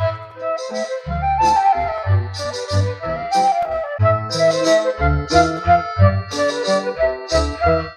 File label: flute spanish, Loops Phonky